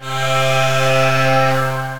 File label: Pad, synth pad; Bailey Pad; Wavetable